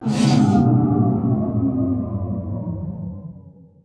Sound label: sys gen power decrease